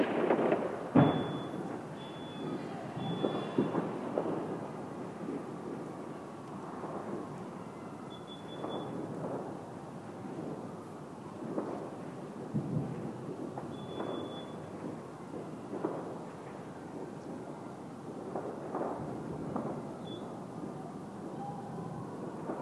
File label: neighborhood fireworks alarm and sirens; new year's